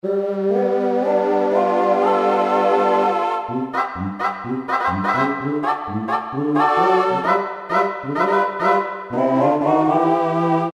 Duwop; Factory Presets

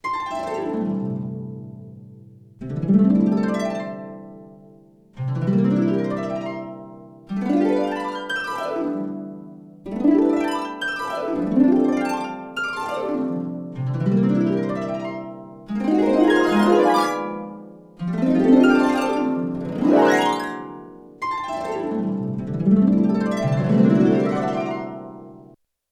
Harp Glissand, Yamaha TX16W; Harp 2

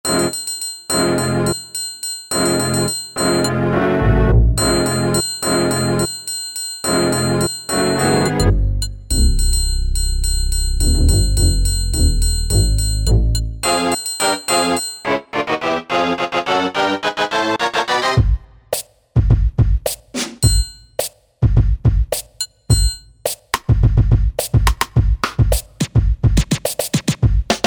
Kit, E-mu Proteus VX

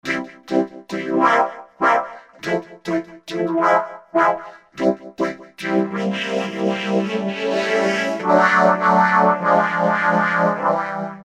Vocoder Wah, Korg MS2000; Factory Patches

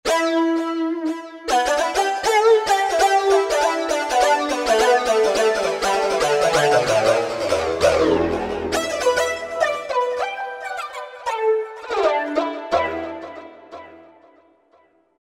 Roland V-Synth Ethno Synth; Factory Patches

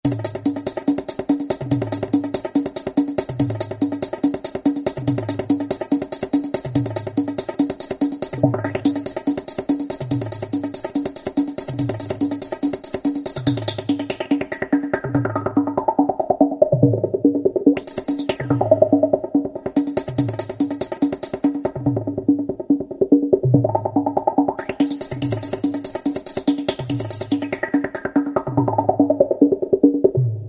Arab Loop, Yamaha YPT-400; Voices

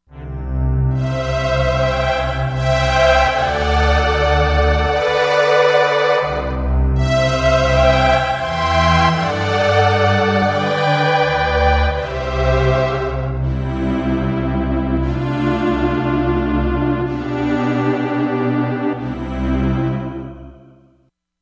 Synth Orch, Korg MSC-07S Synth; Korg MSC-07S Synth 2

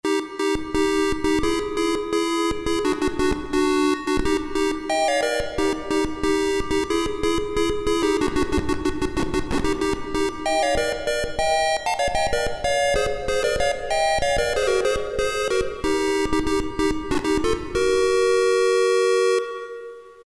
synth, Davoli Davolisint

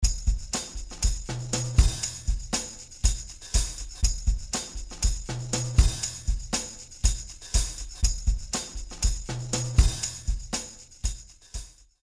Motown Drummer; Apple GarageBand 2; Apple Loops

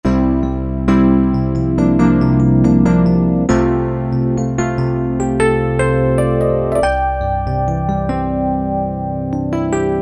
E.Piano, Roland JD-990; Factory Patches

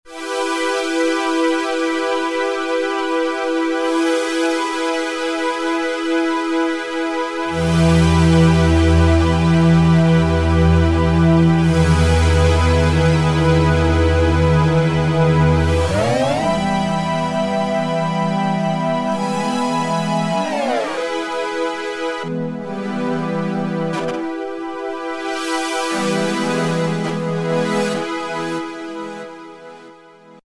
Matrix Pad, Roland V-Synth; Factory Patches

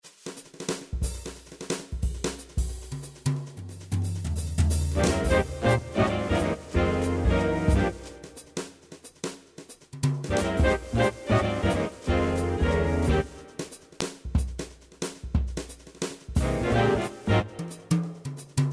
Big Band, Yamaha PSR-3000; Factory Demos

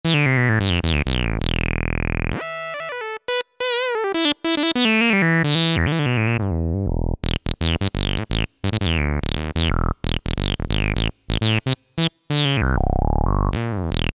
Sw, Yamaha A4000 Piano Keys Synth; Piano - Keyboards

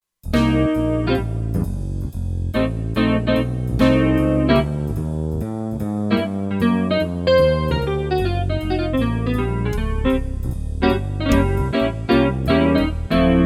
Jazz Band, Kurzweil K2500X; Factory Programs

